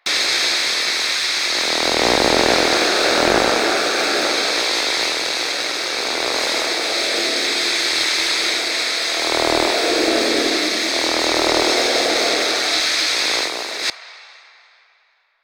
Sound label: TV Hum Loop; Ambience; Props; Warframe; Nineteen Ninety Nine